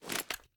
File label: reload end; gmod